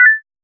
open popup menu in 2d, interfaces

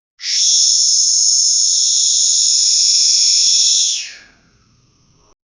a hissing snake

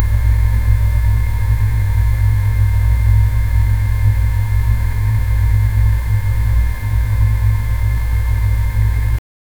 pipe airflow drone smooth; stabilizing

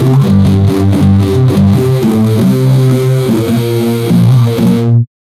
track for advert; blockbuster; SFX Engine

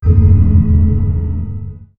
atmospheric video game sound

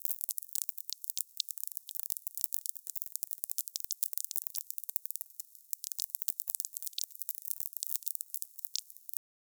welding arc precise high

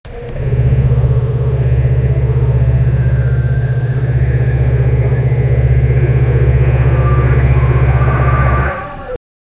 enviro 400 voith struggli, bus

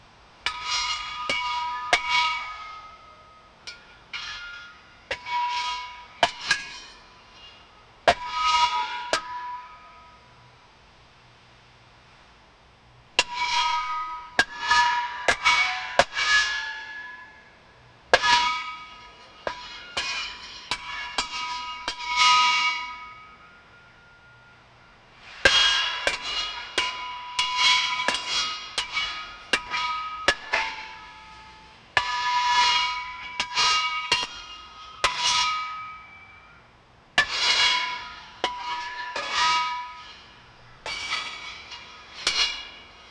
metallic shovel digging

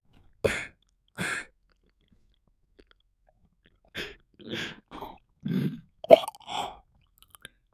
a man drinks a beer; alcohol

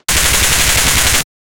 heavy sci-fi railgun shot; cybernetic

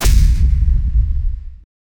divine beam strike earthq

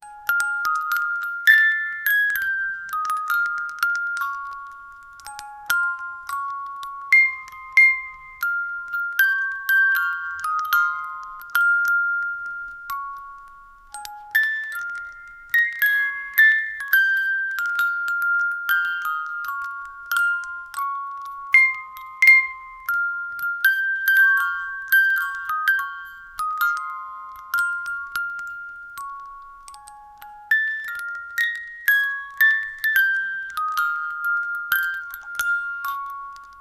baby lullaby

